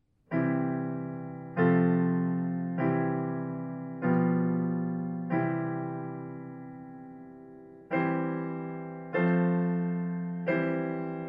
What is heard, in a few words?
C Major Cadences in three positions